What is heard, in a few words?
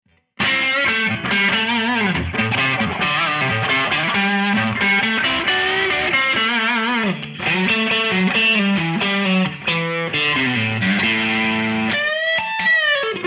Distortion